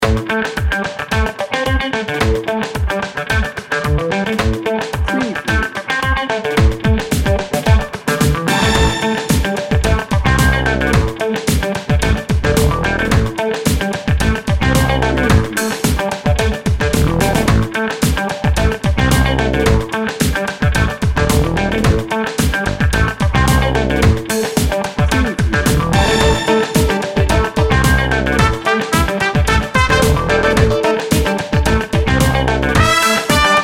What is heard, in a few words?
funky street